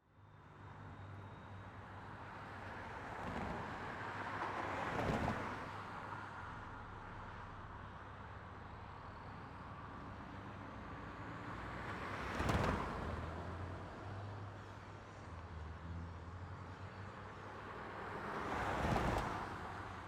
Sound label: Ambience Traffic Over Railroad Tracks; AdobeCC